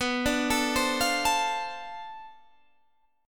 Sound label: B Minor 7th Flat 9th Flat 5th B, guitar chord